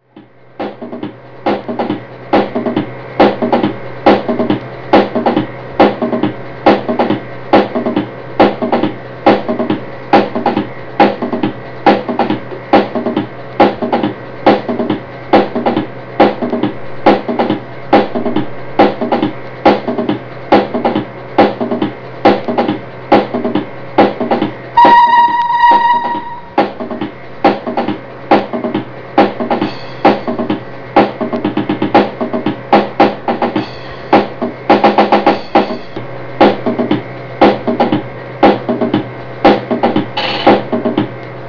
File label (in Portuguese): bateria